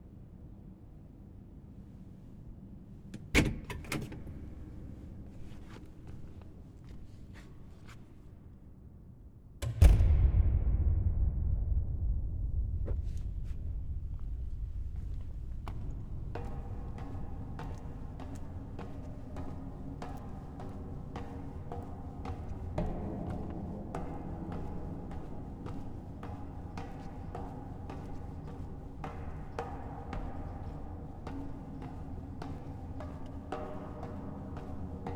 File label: La Silla ESO 3.6-metre-telescope downstairs; soundscape